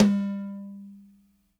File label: RACK TOM, ELECTRO KIT; DRUM N BASS:JUNGLE BEATS